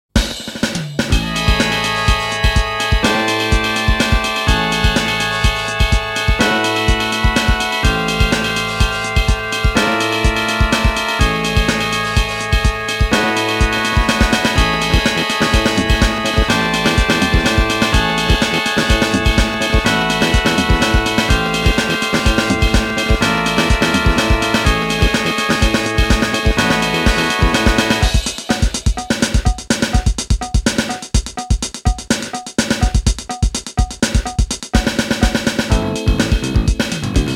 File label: BGM; galaxy force ii thunder blade original sound track